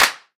natural snare sound a sharp key, steel snare drum sound tuned to the A# note